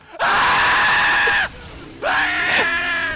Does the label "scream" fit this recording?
Yes